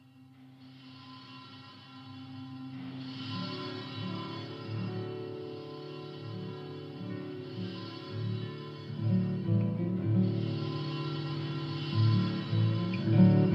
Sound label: Duet for Guitars